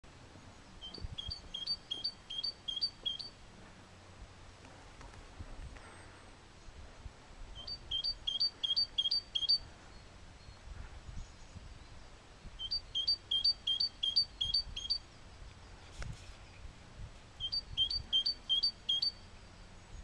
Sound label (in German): Kohlmeise